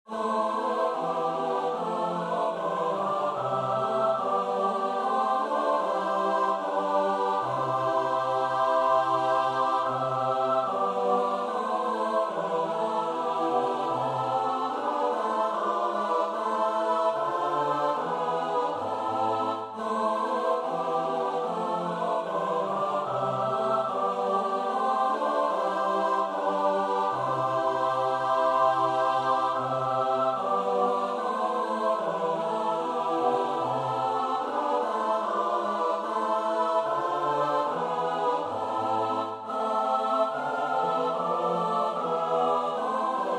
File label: S-A-T-B; Bach (Johann Sebastiaan); BWV 033; 6 Ehr' sei Gott in dem höchsten Thron